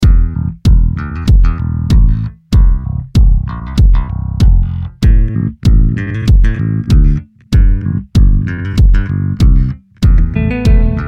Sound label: Groovy Train